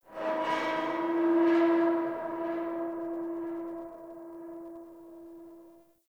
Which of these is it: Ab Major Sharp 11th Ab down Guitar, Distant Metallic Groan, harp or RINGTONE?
Distant Metallic Groan